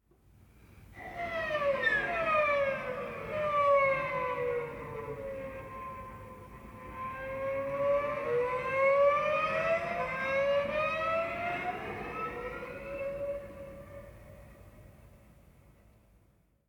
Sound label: Savannah Music